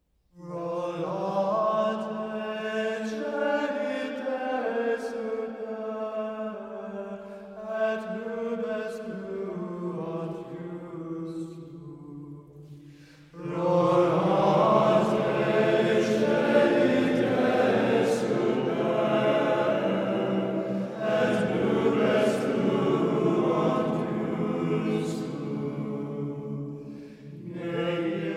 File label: Organum Rorate coeli; Rorate Coeli Marian Sounds of Advent